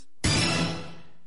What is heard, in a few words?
jingle